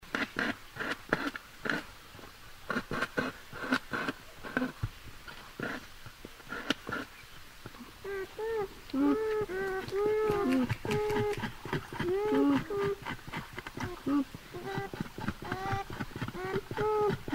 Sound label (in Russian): zvuk bobra gryzet derevo